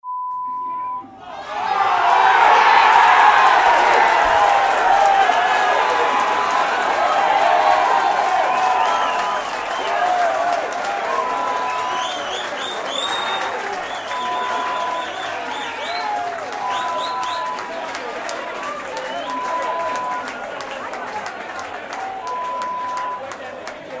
Football crowd sound

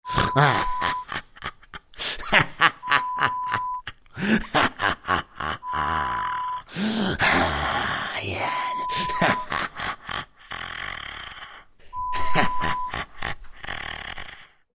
Evil Laughter sound

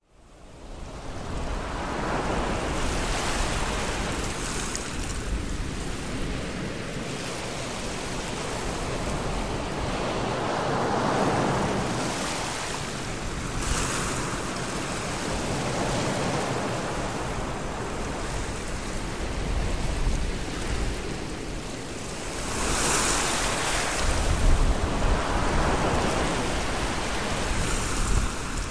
Relaxing with Nature Ocean waves

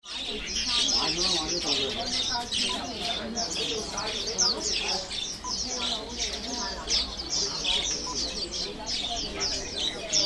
Hong Kong Bird Market Ambience

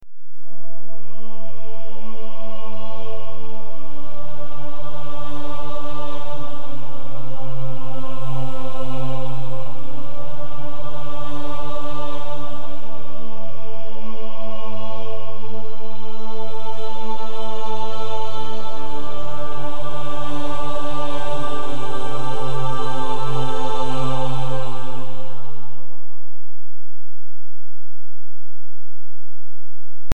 Intro Choir Dm 160

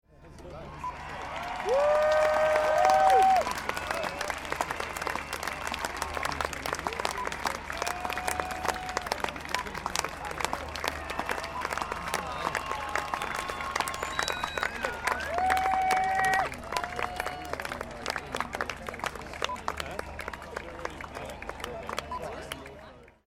Music Festival Crowd Applause

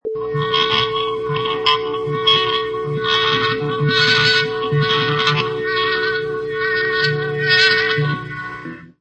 Distorted Guitar Feedback

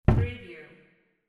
cabinet door close

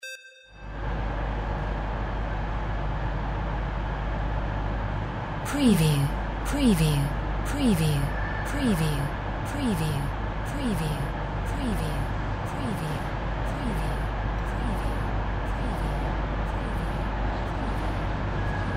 AMB TRAIN STATION ENGINE ARRIVAL

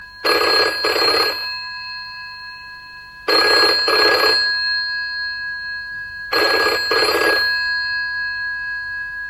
ringtone bell

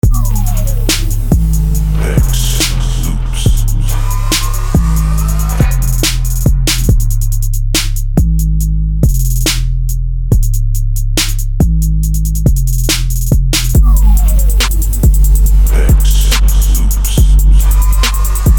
808 kit

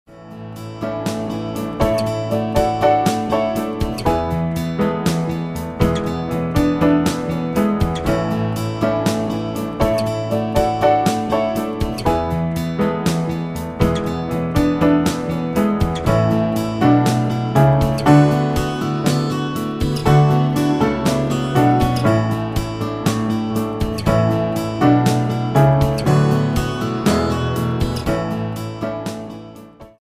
COUNTRY TIME

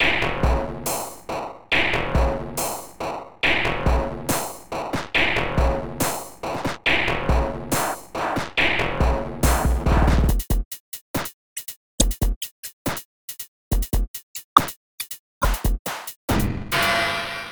loop

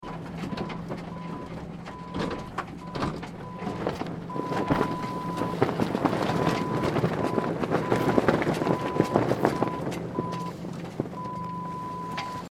Boat Sail Flap

